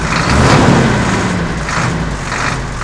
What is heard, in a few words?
truck; AKAI S-Series CD-ROM Sound Library VOL-6